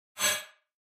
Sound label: Elect Whip, multimedia element